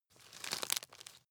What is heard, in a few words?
nut creak; haus garten; kueche